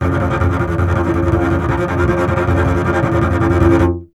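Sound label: string contrabass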